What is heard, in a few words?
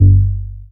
MOOG BASS G2; Best Service Dream Experience